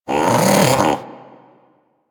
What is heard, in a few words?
Armadillo Vocal Alerted; Characters; Warframe; Hunting Critters